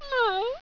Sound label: meow, animal